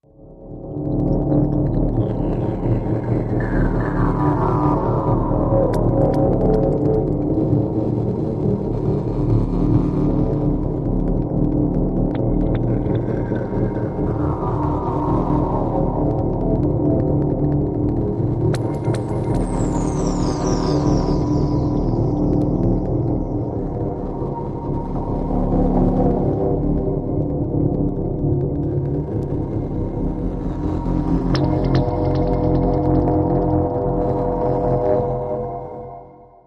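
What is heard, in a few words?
Distant Drone Low Ambience Drone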